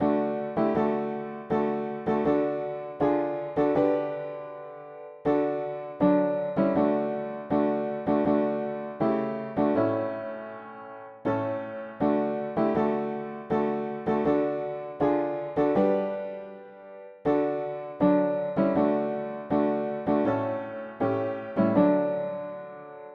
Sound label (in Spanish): mono piano; hymns; Himnario pequeno